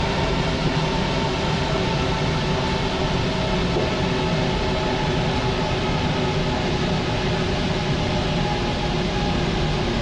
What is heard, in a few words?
Metro fast